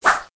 Slingshot Whoosh; Learn to Fly 3

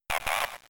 Bind; pokemon; gen 3; attack moves

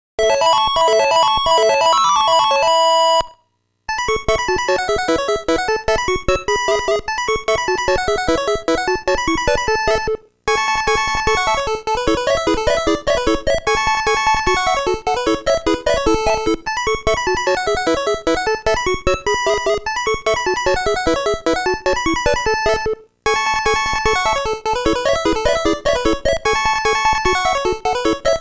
Fanfare ~ Race BGM; Game Sound Museum Namcot; Family Series

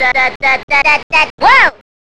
Collect Paper; The Simpsons Bart's Nightmare; SNES

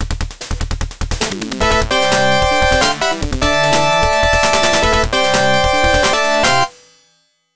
Round Clear; Wrestle War; System 16B; Arcade

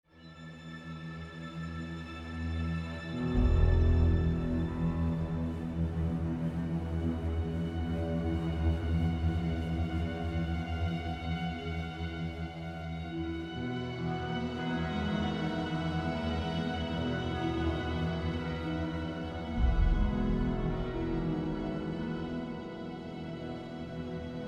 World Music

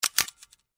Camera Vintage

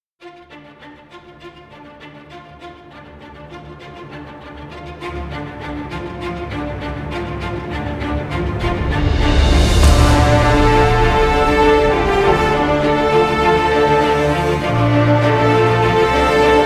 Cinematic Orchestra Ver.

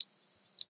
Morgue Door Failed; Still Life; windows

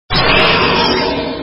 attack

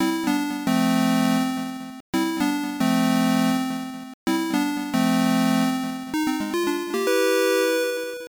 Goal

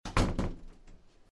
door

